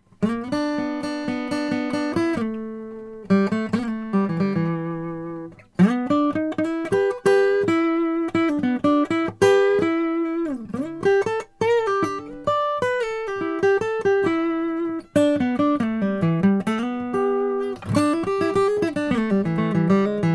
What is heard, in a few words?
froggy bottom custom c sinker mahogany